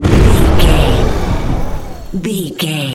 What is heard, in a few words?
wind blizzard storm spell blast